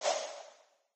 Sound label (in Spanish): rafaga; grim fall